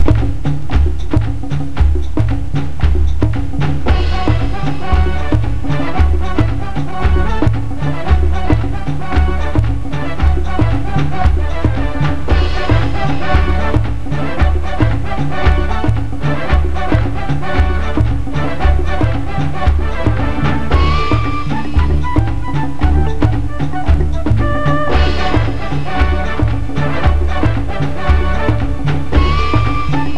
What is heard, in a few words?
ska